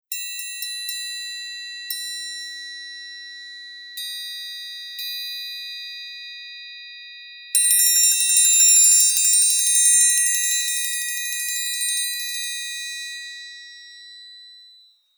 triangulo; Conhecendo Instrumentos Musicais

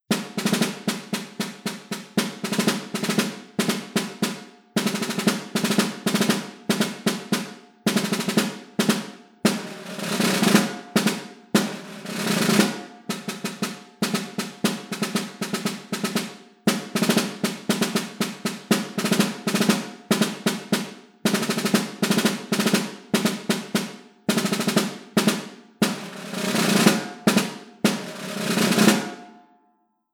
caixa clara; Conhecendo Instrumentos Musicais

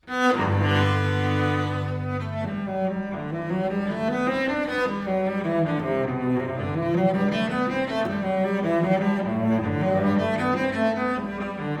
violoncelo; Conhecendo Instrumentos Musicais

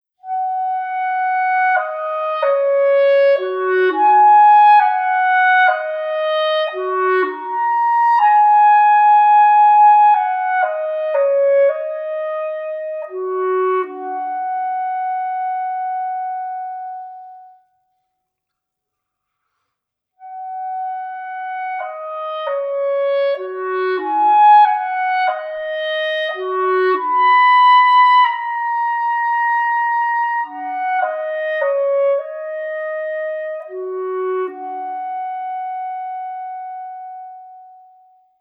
clarineta; Clarinete; Conhecendo Instrumentos Musicais

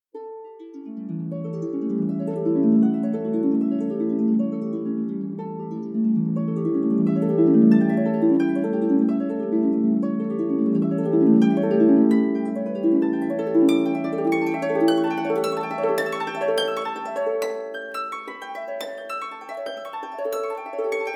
harpa; Conhecendo Instrumentos Musicais

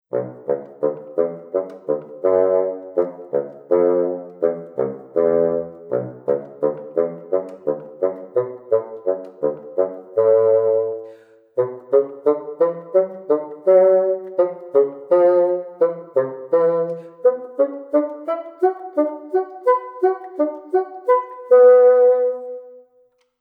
fagote; Conhecendo Instrumentos Musicais

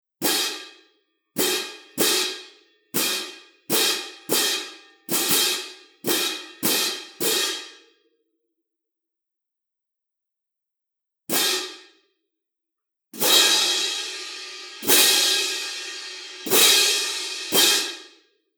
prato de choque; Conhecendo Instrumentos Musicais

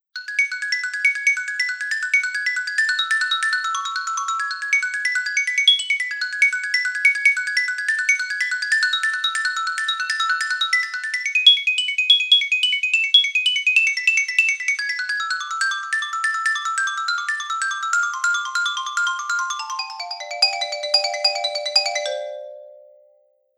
xilofone; Conhecendo Instrumentos Musicais

